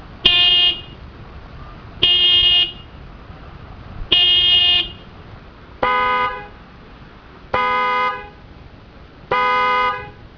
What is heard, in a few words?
horns